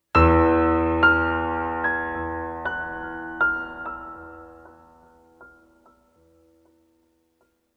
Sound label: One shot Piano